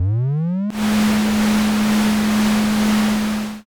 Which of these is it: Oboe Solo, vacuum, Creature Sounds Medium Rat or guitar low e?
vacuum